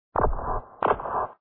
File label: bonus; cyballs